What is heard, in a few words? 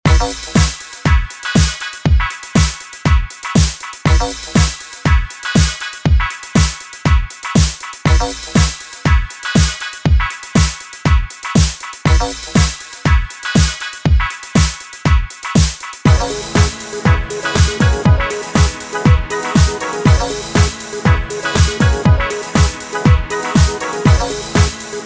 90s 120bpm